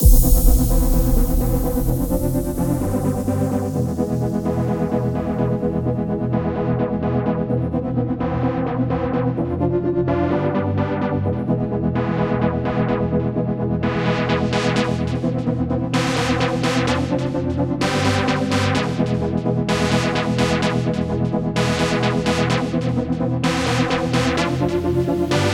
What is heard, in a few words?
future house